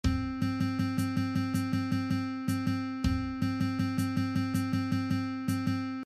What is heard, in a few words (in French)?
Solfege